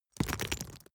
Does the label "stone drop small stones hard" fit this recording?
Yes